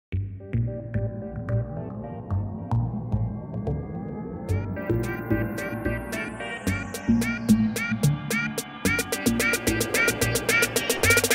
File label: kurdish turkish mashup